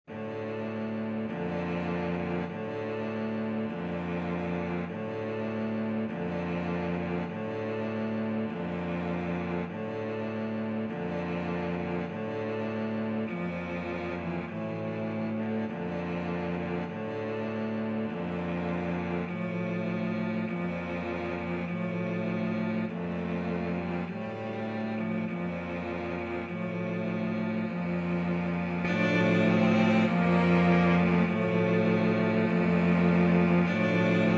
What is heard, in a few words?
chopin funeral march, cello quartet